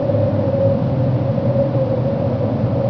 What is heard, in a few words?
wind, ambience; dod